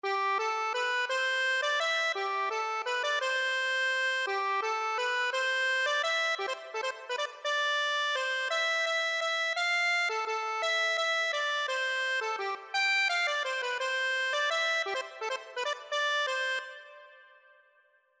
accordion

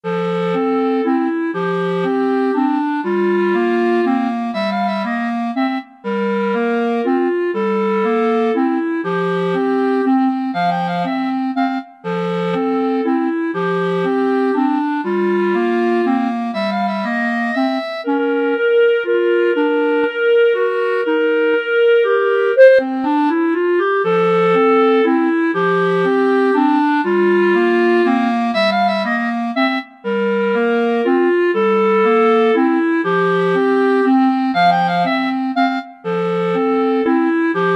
clarinet duet; tchaikovksy

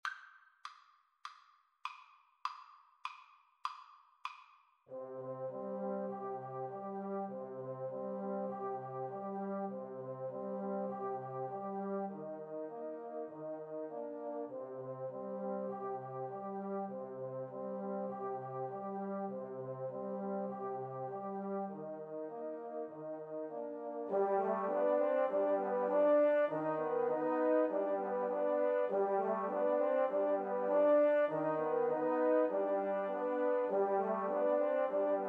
mountain king, 2 Euphoniums Tuba

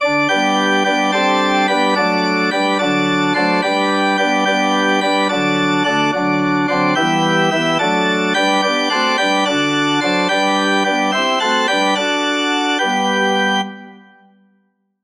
organ

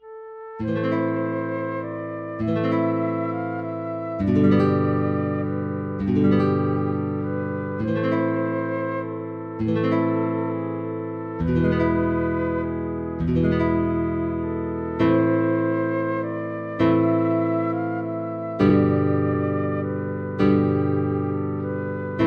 greensleeves, guitar flute duet

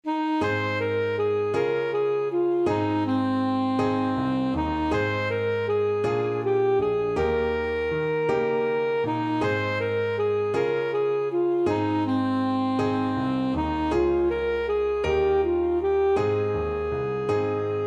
saxophone

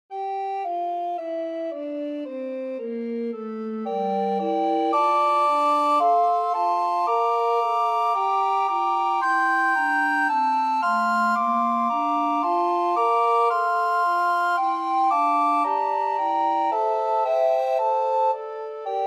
recorder quartet

